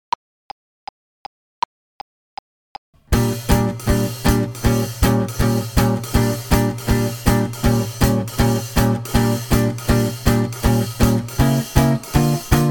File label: drill in bb